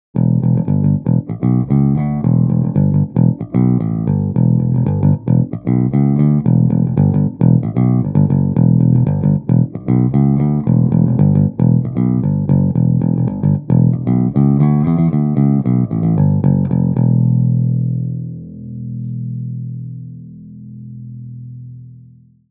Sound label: jazz bass plektrum mic; phil jones double four bg-75